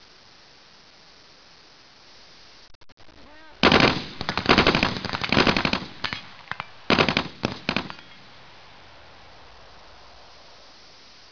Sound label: nazzi Shoot